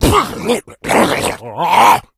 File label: shoved, npc infected